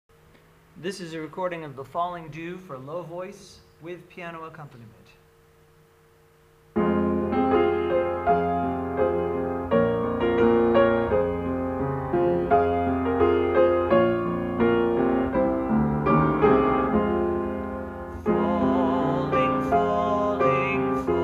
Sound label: low voice with piano